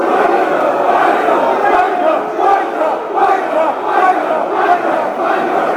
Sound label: wnker, fan chants; crawley